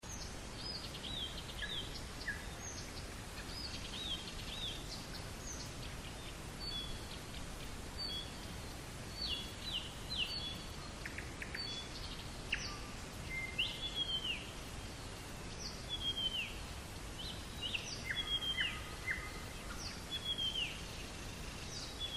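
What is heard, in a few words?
night birdsong night birdsong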